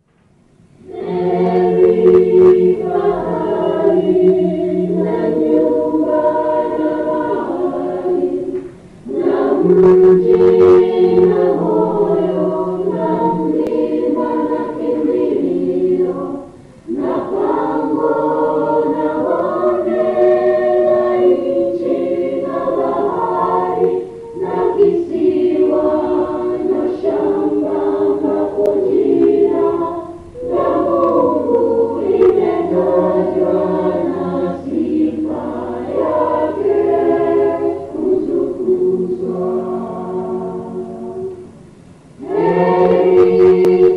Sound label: tutti; heri pahali blessed is the spot